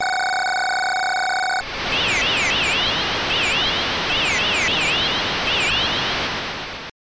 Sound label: Cheering; King Salmon; Genesis